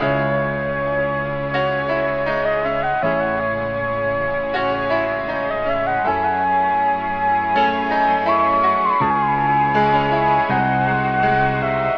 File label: keys and piano, Sad; Loops